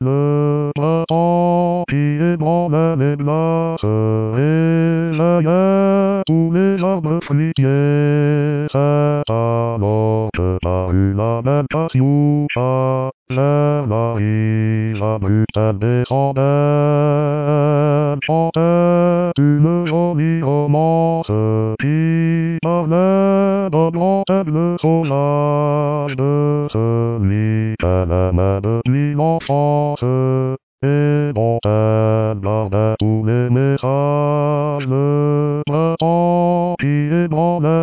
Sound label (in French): Basse